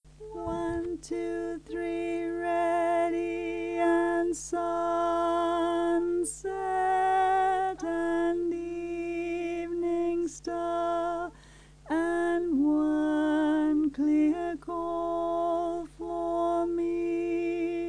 Folk Choir music; Crossing The Bar

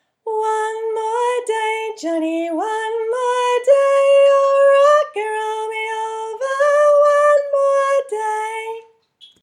soprano

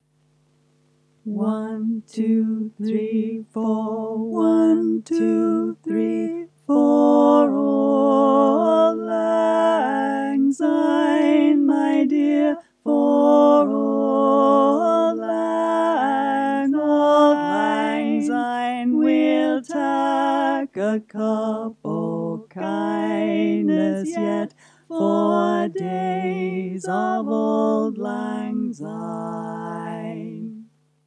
Auld bari and bass chorus, Folk Choir; Auld Lang Syne Resources

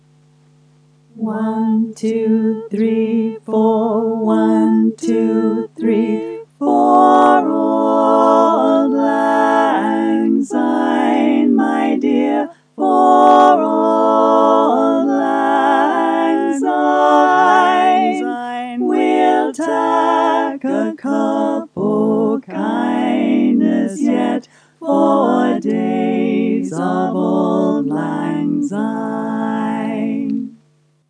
full chorus w words; Auld Lang Syne; Folk Choir music

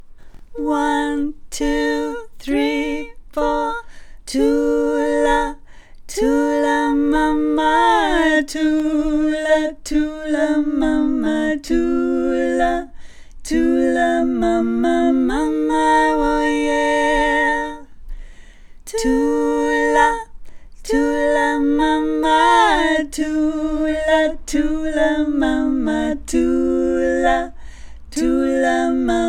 melody and low harmony

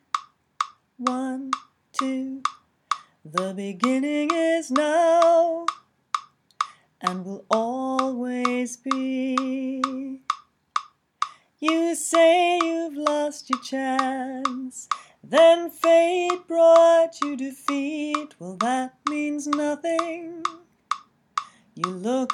lead vocal with click track

